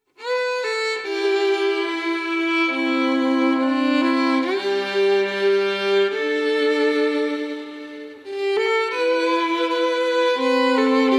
2 violins version; The Parting Glass

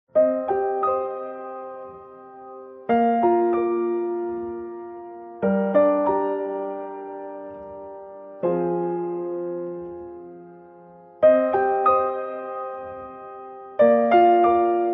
Piano Documentary

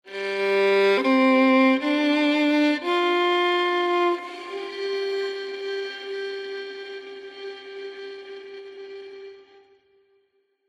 Violin Documentary Drama

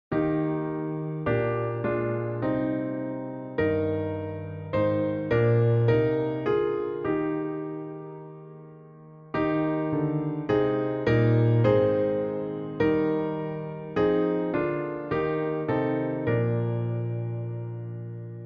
piano version, church music; church music choir hymns

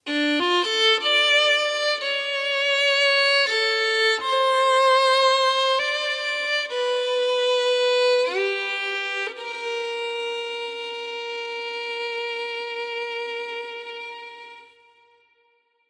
Violin Documentary Drama

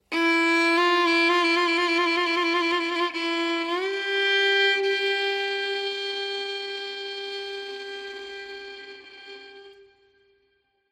Violin Documentary Drama

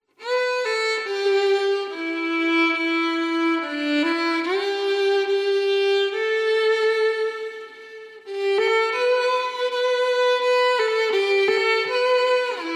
Violin Solo Violin version; The Parting Glass

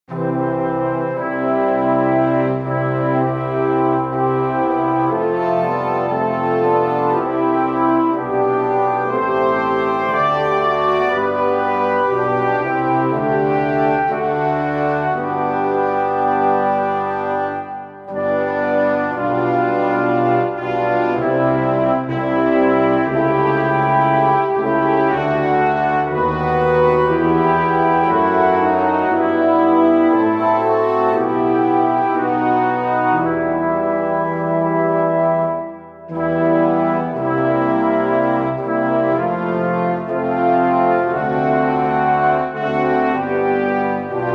brass SLOW version; Eternal Father; church music choir hymns

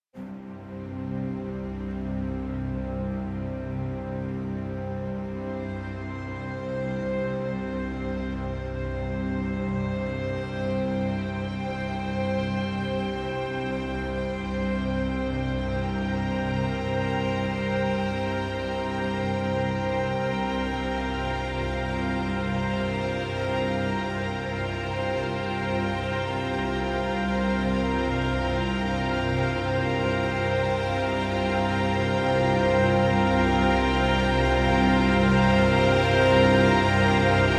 Rich Orchestral Strings Crescendo

